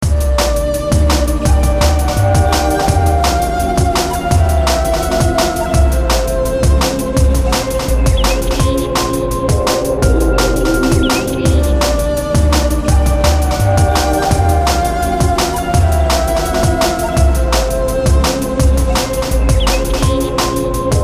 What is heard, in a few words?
Jungle